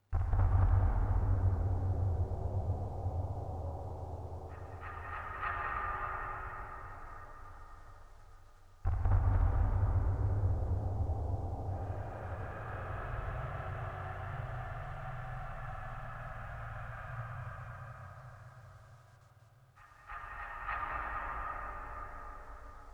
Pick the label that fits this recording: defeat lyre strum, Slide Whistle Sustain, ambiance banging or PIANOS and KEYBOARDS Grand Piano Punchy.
ambiance banging